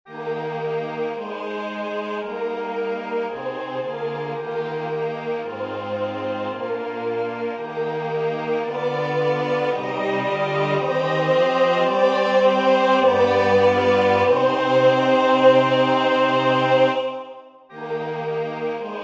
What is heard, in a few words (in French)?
tenor; Erkenne mich mein Huter